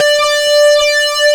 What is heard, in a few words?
keytar; jazz jackrabbit 2